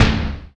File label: DMA XD-5, DIGITAL